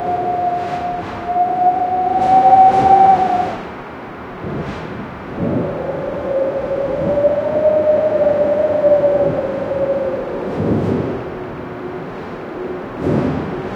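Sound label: high structure big building, architecture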